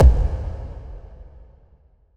FX Impact; Sample Banks; Percussion

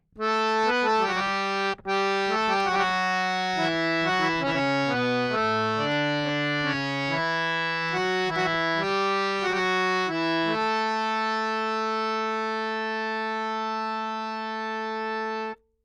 Dry DM 4-4 70, Harmonioum; Sample Banks

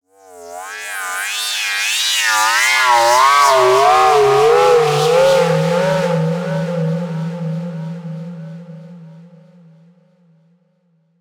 FX Uplifter; Sample Banks; Percussion

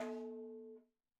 madalam notes R

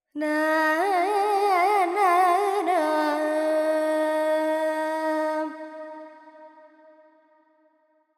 FX Lydian, Vocals, Carnatic Female, Alaps; Sample Banks

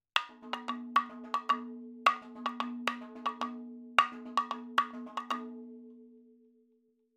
110 7 8 MADALAM FILLS, percussion

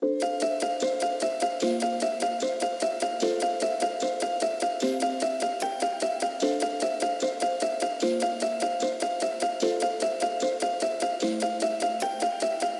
Piano Dreamy Arp Chords Fm 150; Sample Banks; Melody; Keyboards

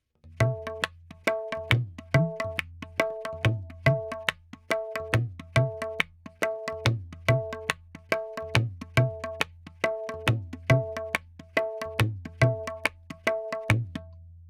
Tabla, 4-4, 70; Sample Banks; Percussion

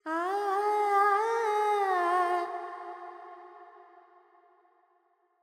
Pentatonic, Vocals, Carnatic Female, Alaps, G; 70 G; Sample Banks

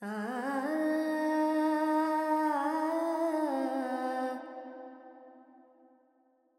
Verb Charukesi, Carnatic Female Alaps; Vocals; Sample Banks

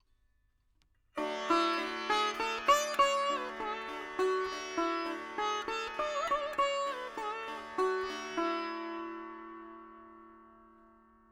4 4 D 100 Dry, Melody Veena; Sample Banks

